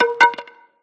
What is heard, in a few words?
Sfx Baseball